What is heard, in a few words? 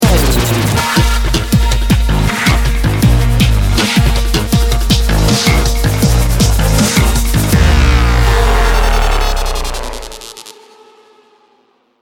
bomb ten sec count; sam marshall